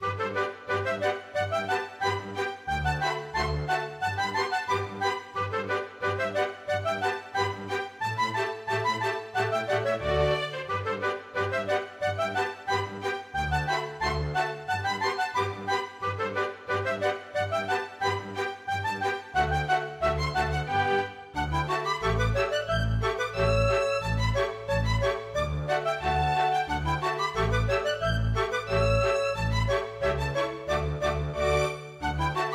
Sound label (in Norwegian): Polka; storgårdsmusikk